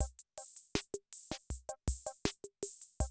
CR78, DrumLoops

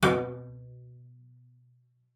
CELLO FINGER B2; Xsample VOL-5

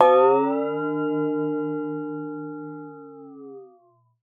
water; Xsample VOL-9

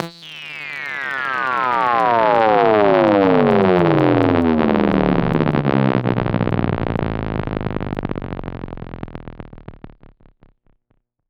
FX, EMS VCS3

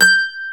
MANDOLIN; E-MU Producer Series Vol. 2; More Studio Essentials; Composer

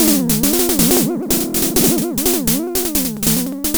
FX, Drum Machines; Fricke Schlagzwerg

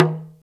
TALK DRM, Heart of Africa; Spectrasonics

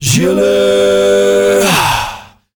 male 5ths, S. African world voices; ILIO; Vocal Planet VOL-5

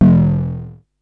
Perc Synth Raw

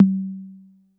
C3 - Analog Tom 5; Yamaha PSR-E323; Dance Kit

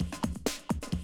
130, Classic DrumLoops

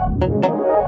PIROUETTE, arpeggios; ILIO; Trance Fusion

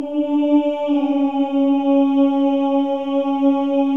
Voices

